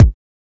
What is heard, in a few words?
2000s verby bass drum one shot a key, Royality free kick drum single hit tuned to the A; kick drum samples